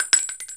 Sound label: shell, Players